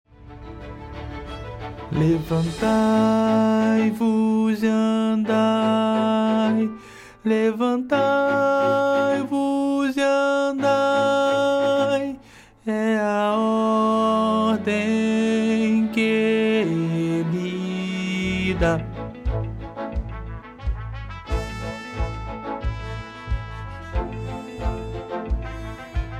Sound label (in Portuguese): BARITONO